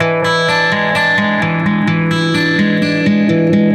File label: Guitar, 128bpm, E1, analogue circuit; musicradar